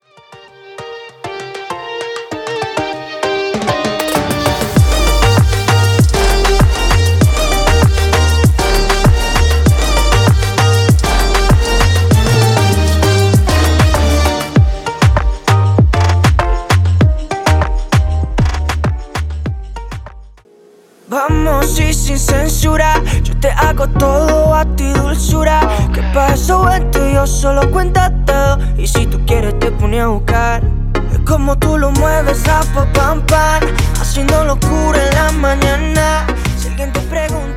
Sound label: Reggaeton